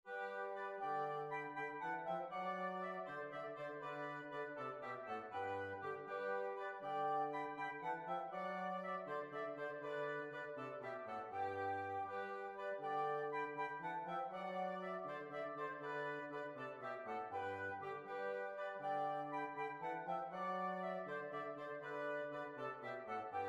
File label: Captain Lanoes Quick March, big band